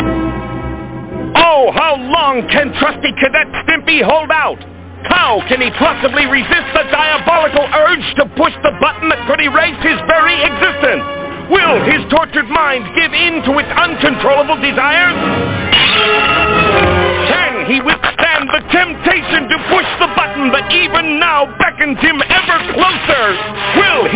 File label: BUTTON